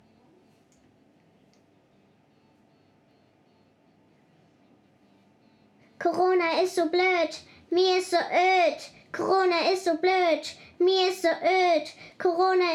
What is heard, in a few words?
song